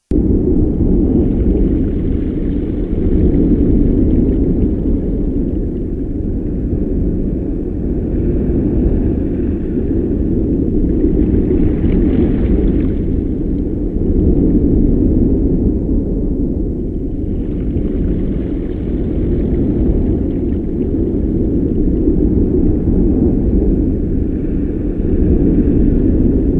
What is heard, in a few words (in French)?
Mer abyssee